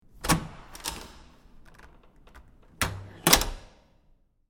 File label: Heavy Wood Door Open And Close With Metal Latch And Reverb, X2